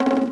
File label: bee; Charlie; Game Examples